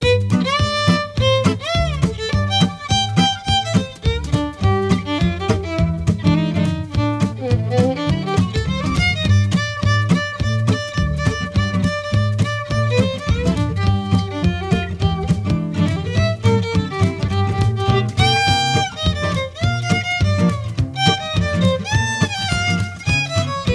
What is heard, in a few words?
menu; Remington Great American Bird Hunt; wii